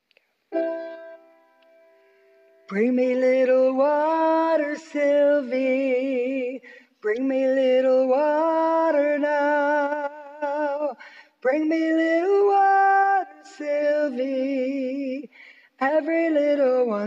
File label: high harmony